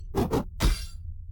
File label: successful battle sword flourish; HLD